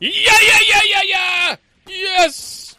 yayayaya

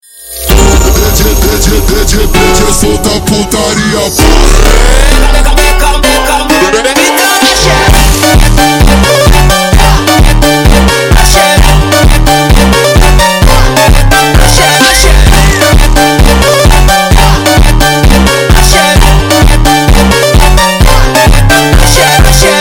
brazil phonk

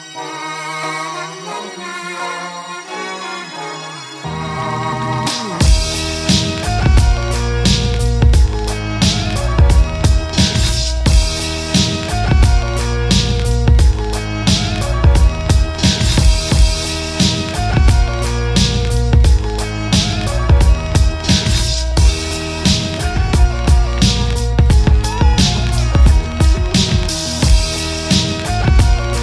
instrumental; mertel kasetcilik

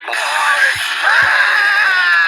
jumpscare; ice cream

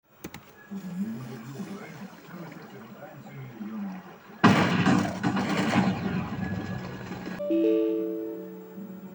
error; minecraft

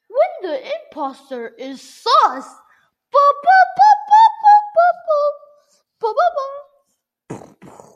screaming; among us; v2

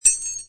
key; diablo 2

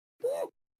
pale gnome hooh

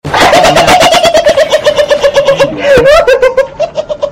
laugh

